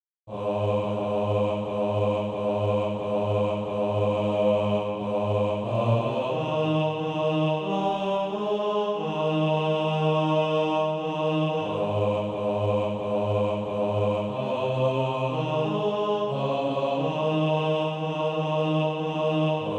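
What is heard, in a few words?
bass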